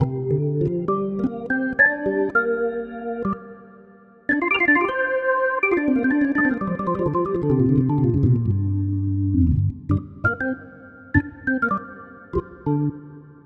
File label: Blues Organ Hammond G 100